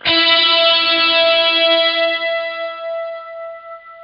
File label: GUITAR, POWER GT